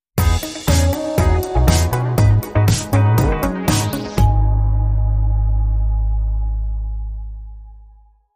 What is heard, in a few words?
Catwalk Strut Sting